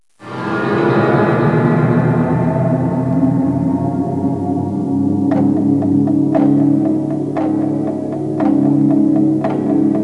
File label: time stereo, space atmo; Terra Sound Library; xlarge